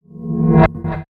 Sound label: Swoosh